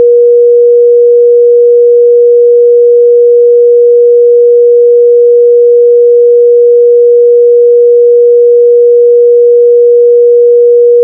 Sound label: sine